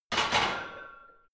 barbell heavy plates replace rack hard, fitnessstudio